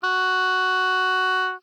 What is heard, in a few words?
Oboe ff Gb4 stereo; Woodwinds